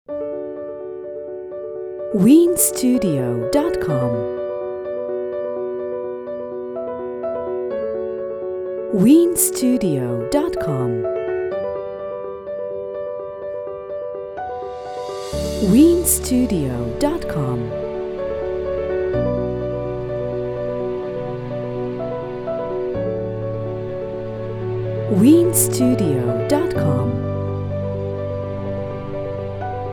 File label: Cinematic Positive